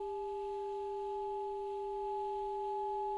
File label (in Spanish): Multiarmonicos